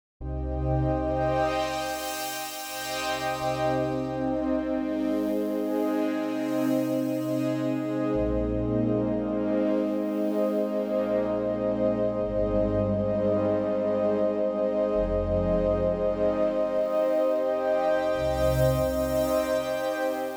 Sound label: Montage 8 FM Sweeping Poly; yamaha